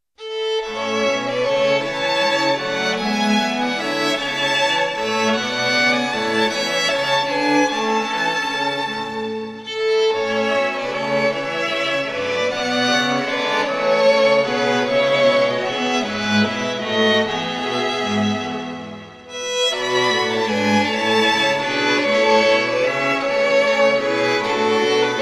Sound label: Gigue, string quartet